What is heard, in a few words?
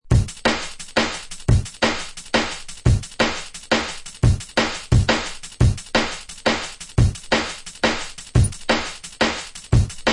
Dreadz Thin Hard Step, beats; ray keith dread jungle breaks vol 1 60 beats for dubplate clashes battle junkies